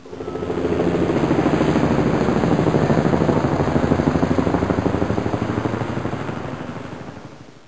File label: heli fly by